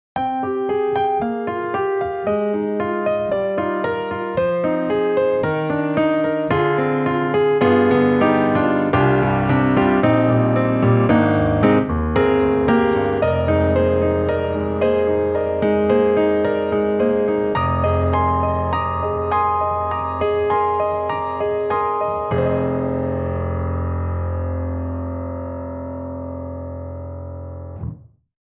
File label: Grand Rhapsody Piano Over Compressed; Waves